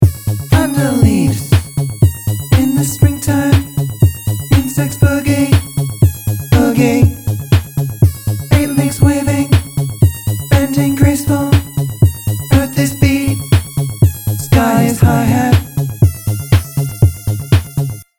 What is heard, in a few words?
Boogie; Insects Boogie